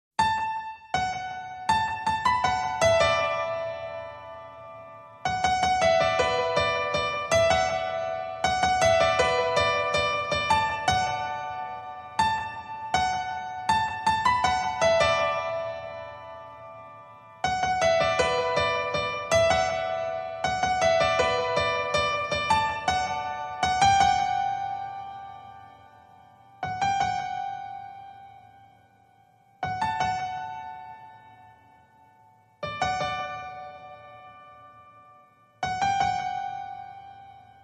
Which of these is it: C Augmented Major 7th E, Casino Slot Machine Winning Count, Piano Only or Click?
Piano Only